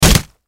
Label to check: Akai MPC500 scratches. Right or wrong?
Wrong